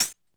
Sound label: Closed Hat Slide Hi; Lost Tapes From Mars